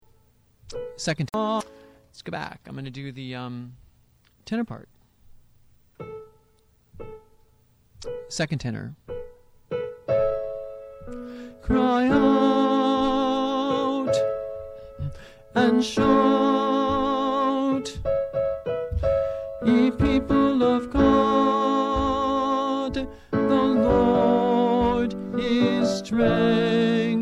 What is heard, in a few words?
Tenor II; District II 2003; Mixed Choir Practice